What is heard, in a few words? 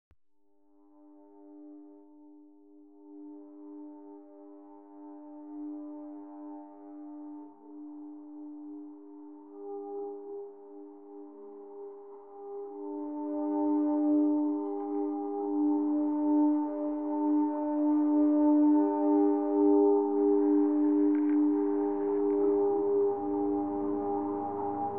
Nearly There Pine Forest Trout Stream Mix